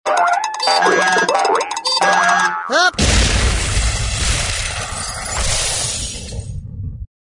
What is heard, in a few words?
comedy screw loose